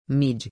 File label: midi